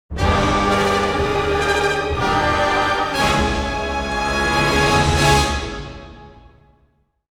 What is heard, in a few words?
Scary Event, music; Mood Scary